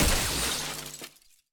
karaoke smash; tft; ceremony; asol

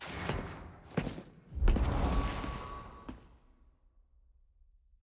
trans archive click; caitlyn

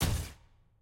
aah; jhin

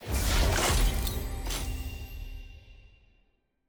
loot reveal permanent rarity legendary

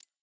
generic click small; collections

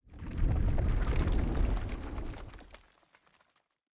building shake

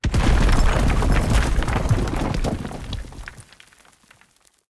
action compound cave in

